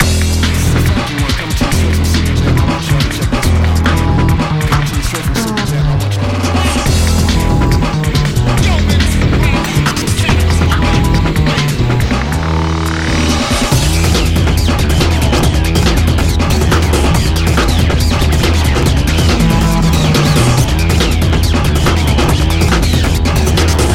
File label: race; D1 Professional Drift Grand Prix